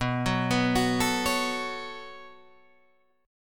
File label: B 7th Suspended 2nd, Guitar, Open D